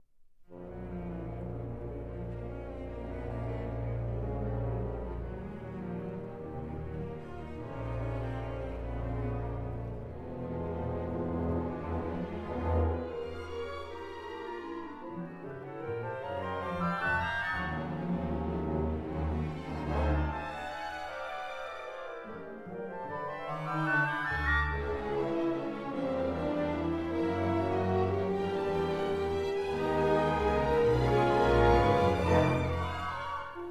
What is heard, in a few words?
Theme Variations and Fugue Andante con moto; Nicolas Flagello